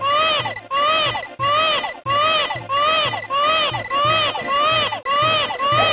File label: mariocry; smw2